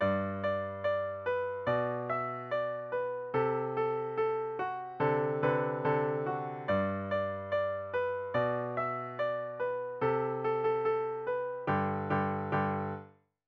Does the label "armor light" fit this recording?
No